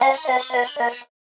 VocoBit Noise, 8-bit; musicradar; 8-bit bonanza

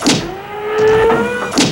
STOMPER, HYDRAULICS; Northstar; The Wizard VOL-1

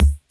BD; Best Service; Dance Mega Drums

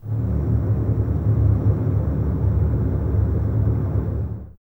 String Ensembles, Basses, Tremolo, D; Miroslav Vitous; 90 s Sample CDs

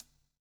COPS STK; Spectrasonics - Backbeat

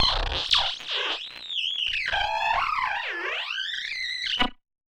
Casio, Glitched, malfunctioning synth

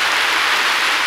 FAT RAIN, RAIN; East West - Global Sounds

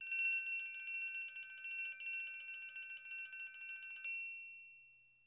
AGO ROL B, Percussion; Sampleheads; NYC Percussionworks; GJ PERC VOL1

